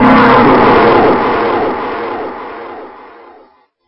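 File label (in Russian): монстр шумный резкий приглушенный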